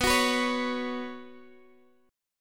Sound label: B Suspended 2nd, Guitar, Open G